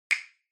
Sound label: Castanets